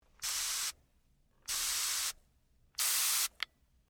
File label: spray